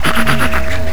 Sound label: RANDOM SQR L, MACHINES